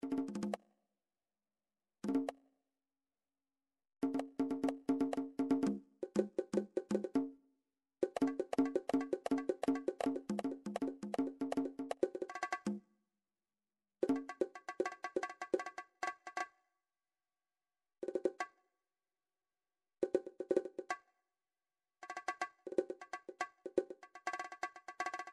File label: midi drums percussion; midi vol 4 drums percussion